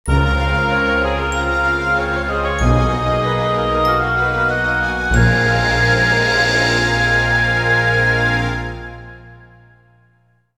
Draw; Dragonseeds; PS1

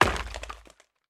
Break Furniture; Going Under

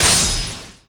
Enemy Weakpoint Hit

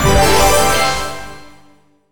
Poker Showdown

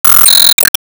Ingame Bonus

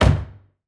Crash

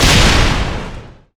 Enemy Tower Active Hit; disney star smash android ios mobile gamerip 2020

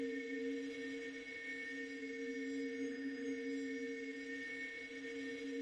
TV Store Entrance Ambient; TV Store - Entrance (Ambient 1)

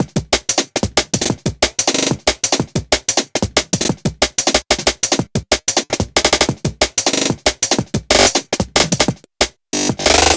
Break 185BPM

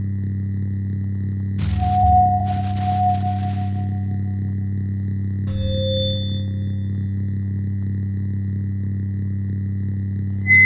Mic; leisure suit larry magna cum laude

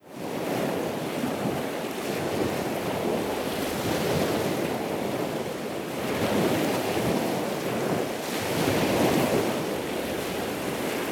Molokai Ocean

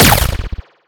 Shoot; EyeBrawl

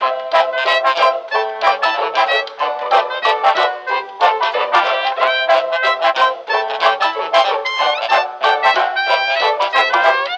gramaphone Ragtime vinyl; Don't Starve Giant Edition; PS Vita

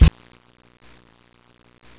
Car - Land

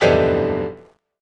PIANO MISS

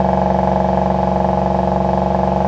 Car Cuda

